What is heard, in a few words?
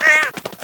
die; hl2